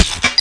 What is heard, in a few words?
MINE; rrdemo